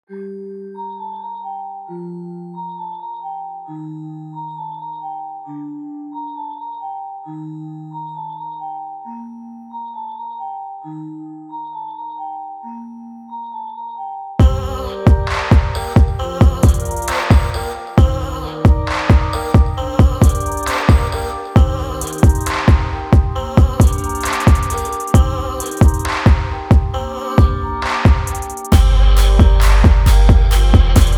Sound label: Bells Mix